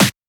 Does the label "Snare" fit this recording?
Yes